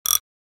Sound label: Socket wrench turning single twist